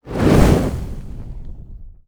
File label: Fire Lord Attack